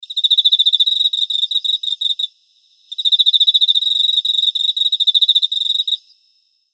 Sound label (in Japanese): エンマコオロギ